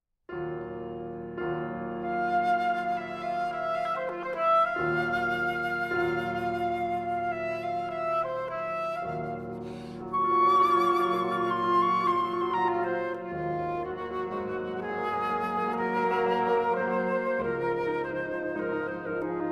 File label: Sonata For Flute And Piano; Leo Smit